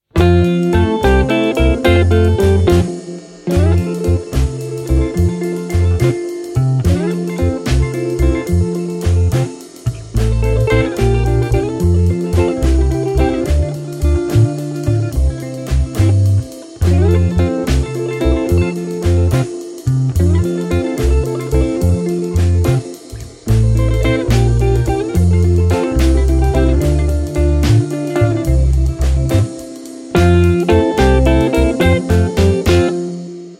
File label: hallo felix wie gehts instrumental